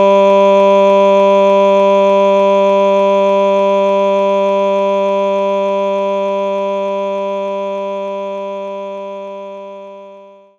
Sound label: Solo Vox G3; knights try